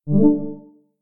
complete media burn; stereo; ocean

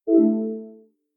device removed; stereo; kde; ocean

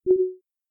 complete media error; stereo; kde; ocean

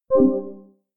trash empty; stereo; ocean

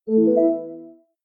message new instant; stereo; kde; ocean

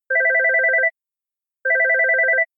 phone incoming call; stereo; kde; ocean

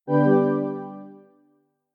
desktop logout; stereo; kde; ocean

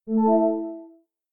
dialog question; stereo; kde; ocean

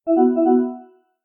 battery caution; stereo; kde; ocean

